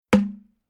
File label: Forestry Big Hollow Wood On Wood Logs Impact Single Bright Click